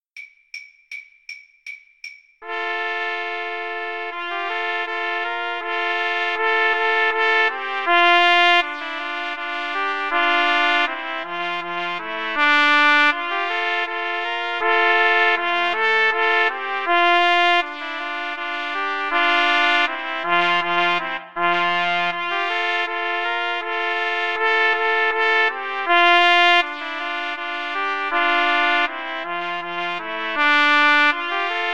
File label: slavonic, Trumpet Trio